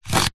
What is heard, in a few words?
leisure foosball plastic score slider single slide